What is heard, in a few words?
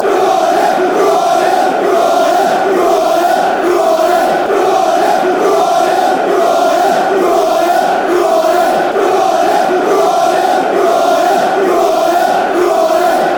who are ya, fan chants; nffc